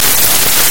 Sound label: Baby Crying